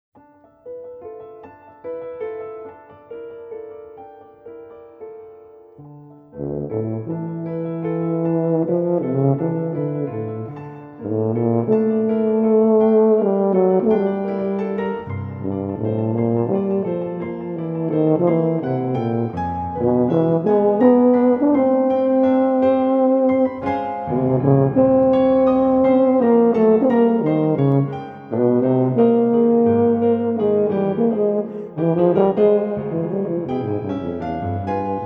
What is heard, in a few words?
for tuba and piano